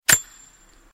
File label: Flint and Steel